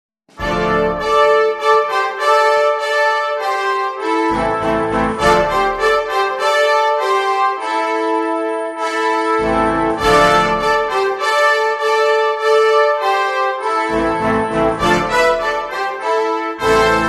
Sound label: Hunami - Fanfare, Fanfare Band